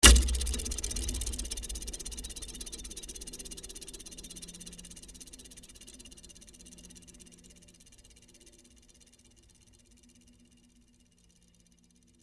Door Stopper Heavy, Texture, Door, Stopper, Heavy, Movement; Miscellaneous Door Sound Effects